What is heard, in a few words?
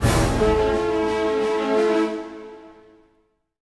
Orchestral Loops; 128 MANHATTAN